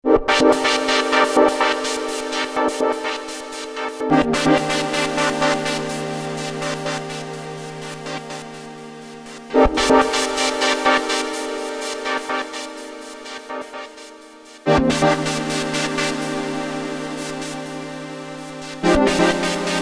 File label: Vibro Pad, synthesizer; Sunsyn